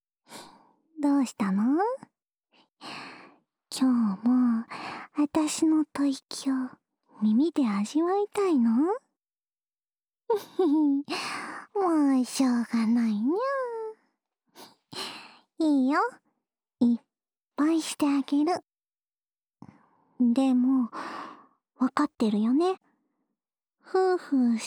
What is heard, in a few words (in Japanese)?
安眠用お耳ふーふー 右耳